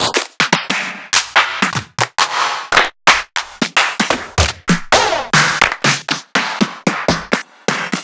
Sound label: Claps